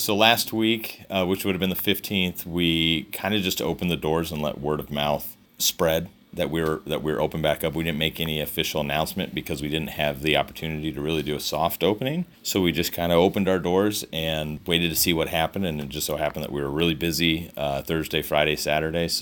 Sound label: Fiddle Buzz